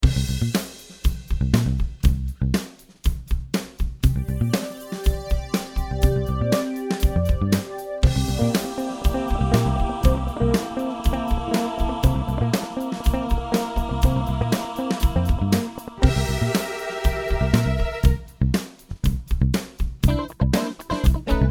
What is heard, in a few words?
funk, instrumentals